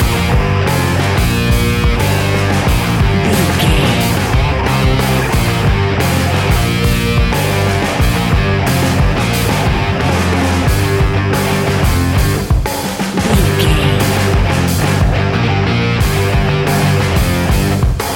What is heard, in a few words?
A rocking music track with rock guitars, heavy rock drums and thumping rock bass lines. Heavy, loud; A rocking music track with rock guitars, heavy rock drums and thumping rock bass lines. Heavy, loud, aggre